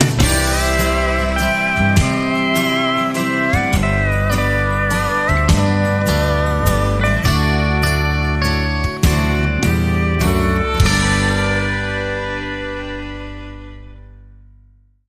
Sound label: Sunset Waltz